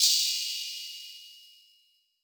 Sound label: Gravel Crash; trap; Cymbals; Cymatics; Trap Starter Pack